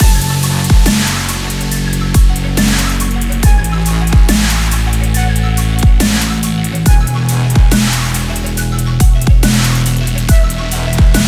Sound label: the extreme sports dubstep loop